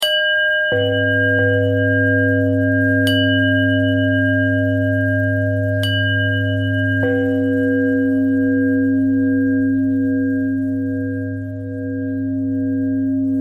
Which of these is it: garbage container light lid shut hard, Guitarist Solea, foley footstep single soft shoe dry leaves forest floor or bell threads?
bell threads